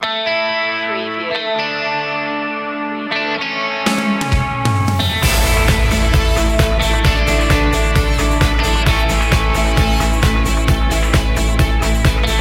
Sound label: victory day, royalty free music rock moderate